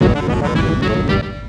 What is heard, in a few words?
Jingle Boss Victory